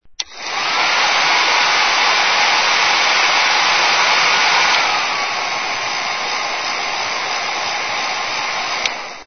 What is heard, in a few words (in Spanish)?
Secadora secadora pelo